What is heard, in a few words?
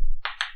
Fingers